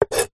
food knife scrape vegetable choppings from wooden chopping board